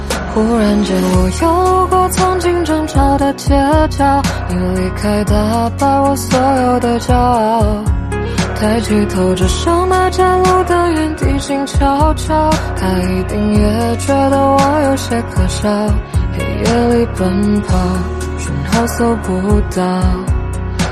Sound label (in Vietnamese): Nhac Chuong